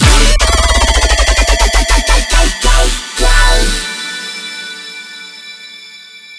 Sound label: bass shot 150 D Maj; BOBA; base pack